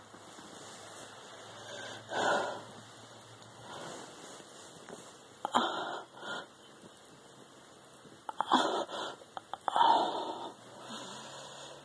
girl sounds, woman sounds, trying to be quiet, raining, outdoors; F4A; Lazy Afternoon